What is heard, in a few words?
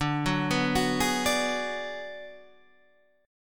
G sharp Minor 7th Flat 5th, chord